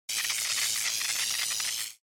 Electric Shock, Long

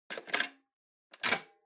Portable Stereo Tape Insert Close

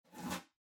Cardboard Box Far Label Peel Off; ダンボール; 遠い ラベル 剥がす; 梱包材

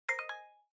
OS Sound (Speaker-Notification)

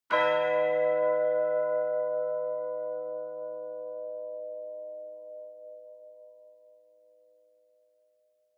Church Bell, Low Short

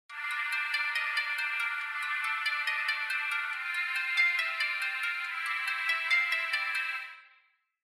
Feature Phone Alarm

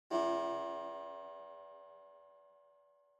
Pendulum Clock Chime Far Short

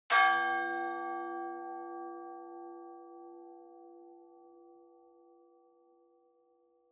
Church Bell Short

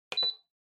Electric Fan (Switch)

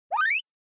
Motion Slide Low

